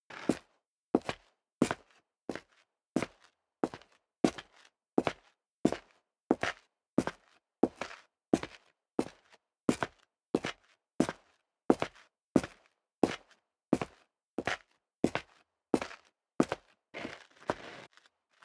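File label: step leather shoes concrete grit walk sequence, footsteps; schritte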